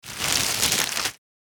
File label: Thin shopping bag